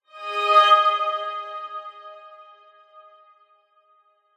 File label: musical violin rise short orchestral reverb; zapsplat